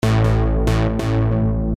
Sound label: Moog Bass A; Loopology; Crying & Dancing; Techno & Dance